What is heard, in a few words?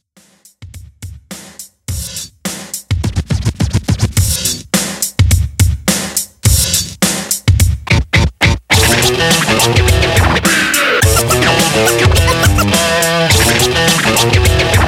Party Intro 105Bpm Clean